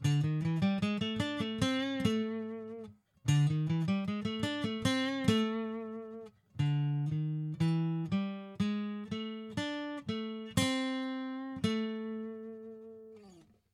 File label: Blues lick, guitar